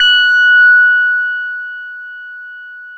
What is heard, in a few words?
F#6, Bass; E-MU Producer Series Vol. 6 – Analogia Project; Boom da Bass